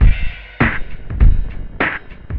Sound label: drloop, drumloops, Vinyl Attack; Masterbits